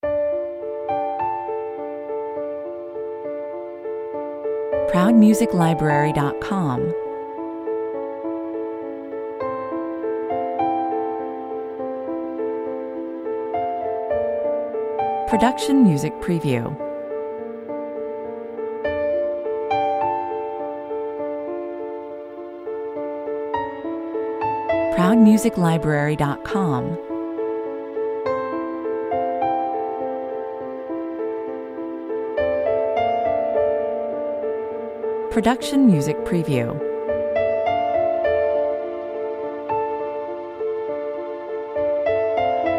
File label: autumn piano